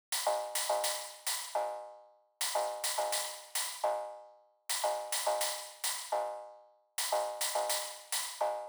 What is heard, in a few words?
Drum